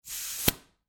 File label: Industry Air Hose Detach Tool; Air Pumps; Machines